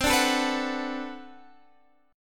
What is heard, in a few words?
B-Minor 7th C